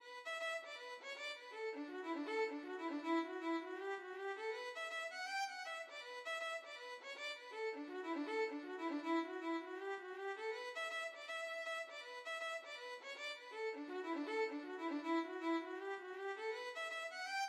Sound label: ships sailing violin